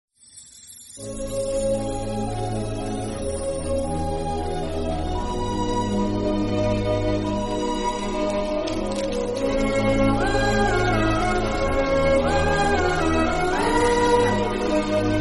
Tamil Bgm Theme

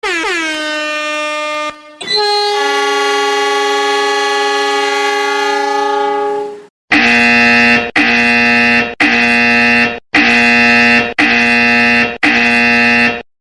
Reggae Ringtone

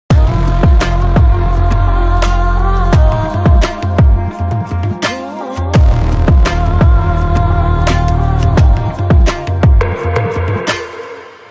BGM; Lokesh Kanagaraj

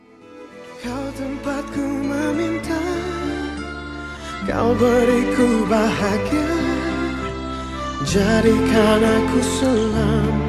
Ringtone

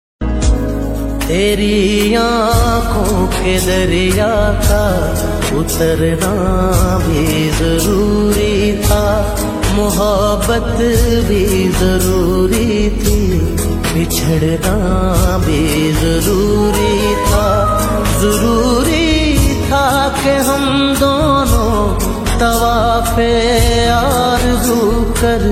Hindi Song Ringtone

